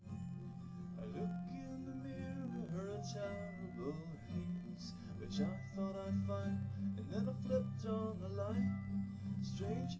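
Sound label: nodrums live recording 250ms 1m, underdetermined; SiSEC11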